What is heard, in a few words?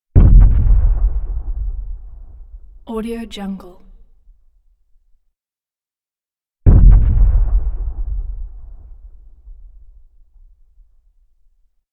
sonic boom explosive twice slower; prosoundfx